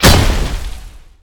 weapon impact heavy

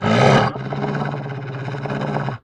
groan, dog

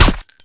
mk18 suppressed; ins2; codol freedom

